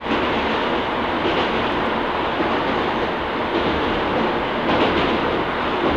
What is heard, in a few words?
TRAIN; treni